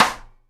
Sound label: SIDE STICK, Live Snares